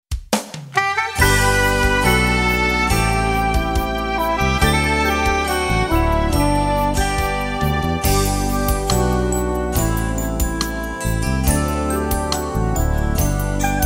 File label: Backing